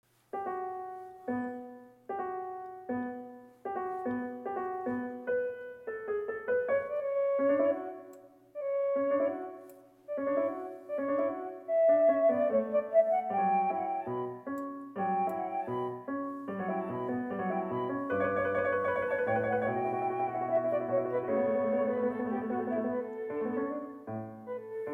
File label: Diabolic Fugue, flute